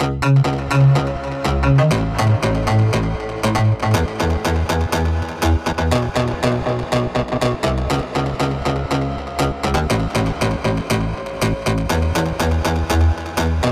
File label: BIG BOUNCER